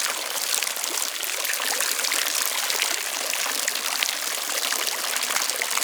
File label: Ocean Drip Stream Large Loop; Ambience; Props; Warframe; Grineer Ocean